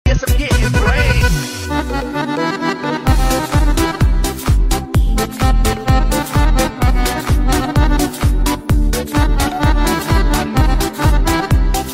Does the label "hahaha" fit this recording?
No